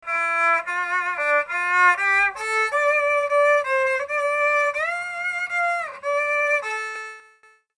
erhu

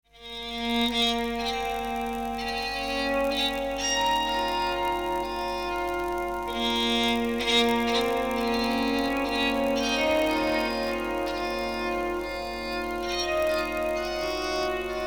bowed long sample

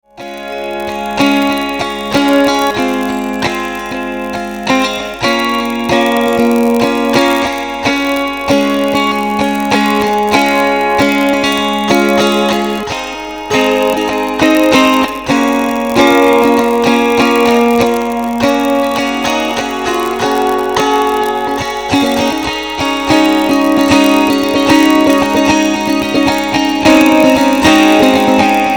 key of d chords

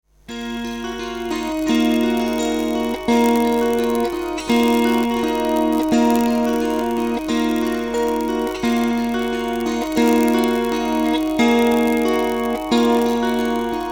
melt 10 string

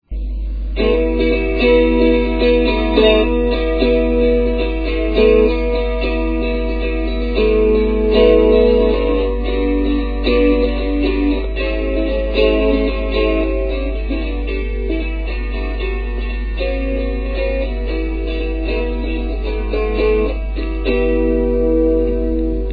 10 string chordal sketch

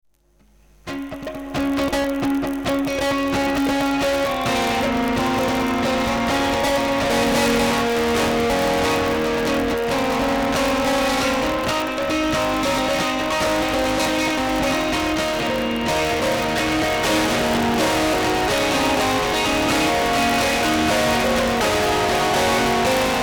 longer rock

